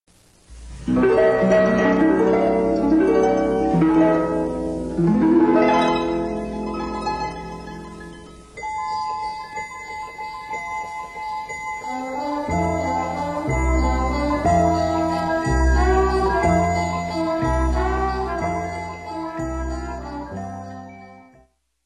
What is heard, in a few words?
harp one fade